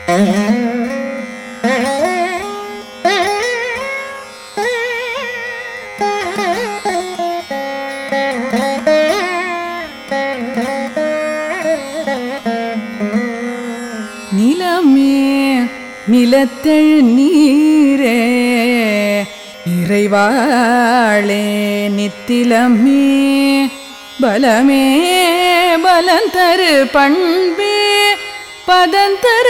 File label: Sri Rajarajeshwari Andhadhi Kannada Chanting